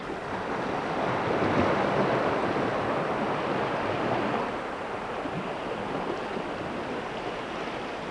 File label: ocean waves; environment; Tribes2